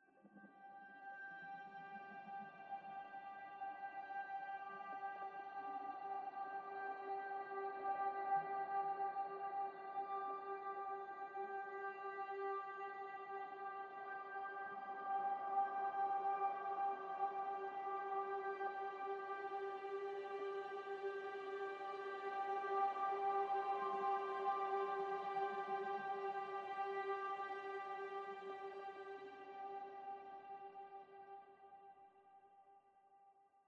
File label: Atmospheric Piano Atmospheres only